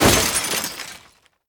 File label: Building Particle Falls On Floor